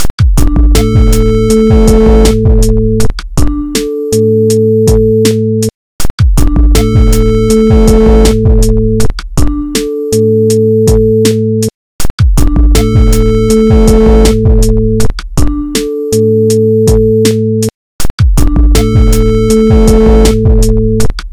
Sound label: Menu Background Music; Save the Geddonverse